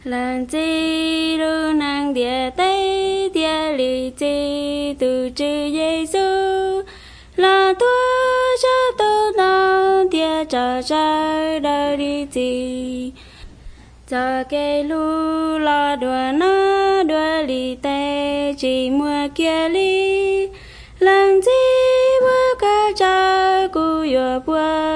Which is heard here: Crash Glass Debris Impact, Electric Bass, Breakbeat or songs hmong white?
songs hmong white